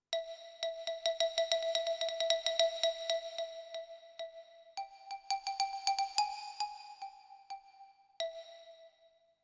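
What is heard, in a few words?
Xylophone, digital piano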